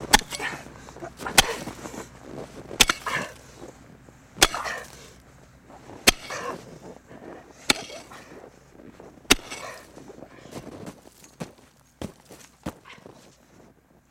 Digging Snow Effort, shovel

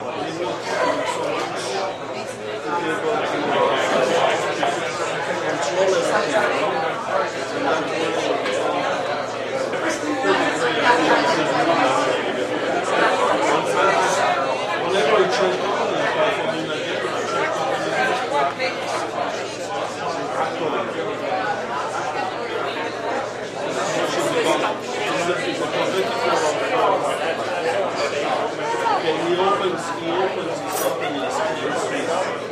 Bar Atmos Loop Med Cro; bars & discos

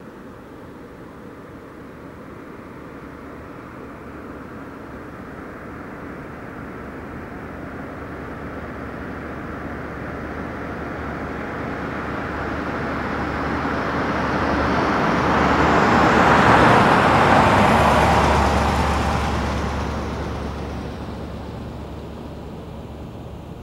Cadillac 1990 Drives By Slow

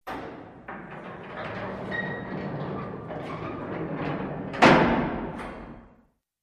Cell Door Close Metal

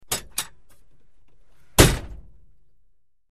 Humvee Door Opens; door, truck

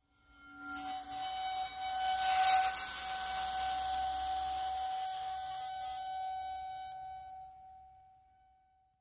Vibrate Bowl, bowed metal

